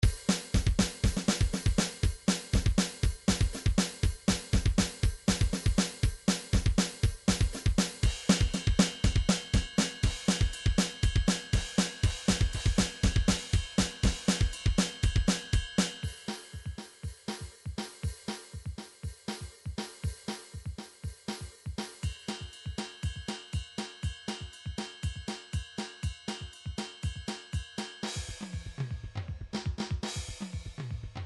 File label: drum grooves midi loops metal; Steinberg Nice Beats 1000